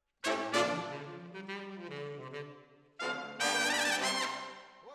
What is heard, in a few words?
Decca, Jazz for piano